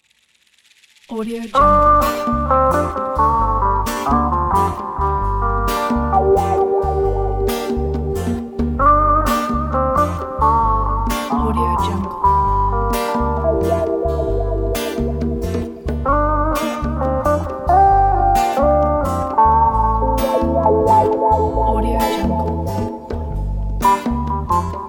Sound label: lounge jazz